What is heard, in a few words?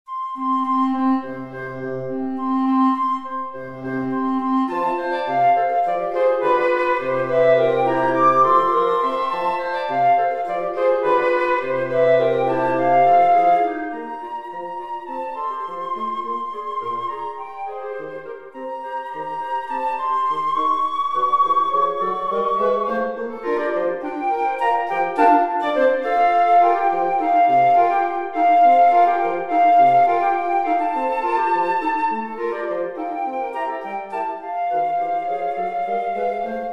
woodwind quartet